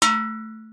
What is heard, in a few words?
metal